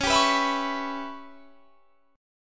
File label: Db Minor 7th Flat 5th Db down, chord; strummed